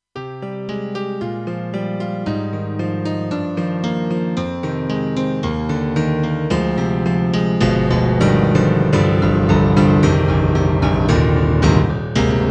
KORG M3R Piano 16'

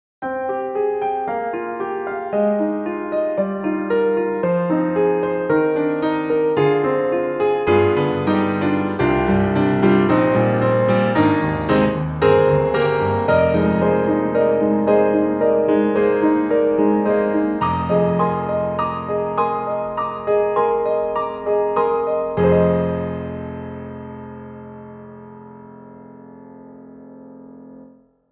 Steinway D, Sus, piano; EastWest; Quantum Leap Pianos

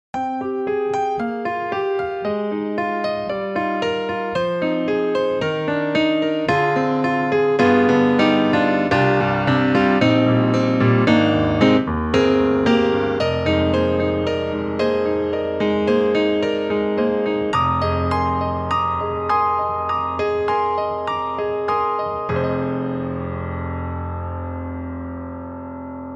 Grand Rhapsody Piano, Dynamics for Sure; Waves

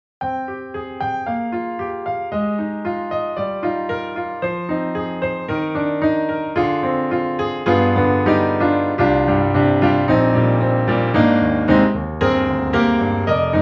Studio Grand, Aged Strings; XLN Audio; Addictive Keys

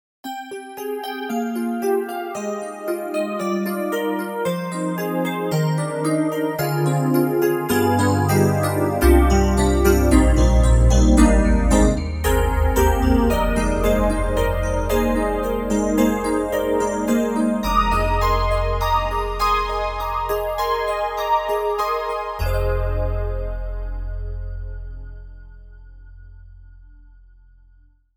YAMAHA MOTIF-RACK XS, TX816 Bell Piano

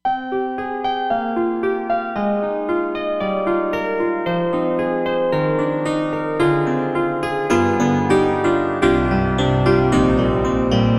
Roland SD-50 Pop Piano

